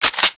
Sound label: railcanon draw, weapons; cstrike